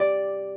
harp

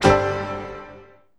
ENTRY CODE; maimai

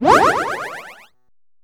MAP GET; maimai

